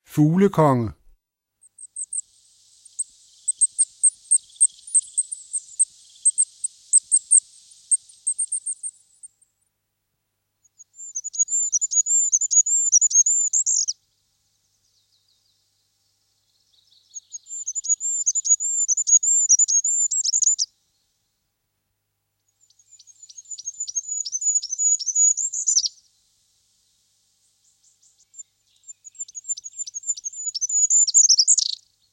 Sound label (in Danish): Fuglekonge